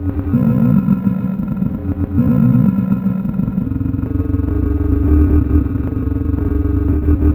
Beastly Roar; Sonic Foundry (Sony Creative Software); Loop Noir

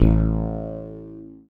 STRANGE BASS; Masterbits; Dance Trax

